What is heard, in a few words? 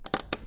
chest opening